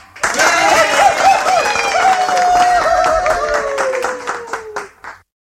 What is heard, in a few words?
people claps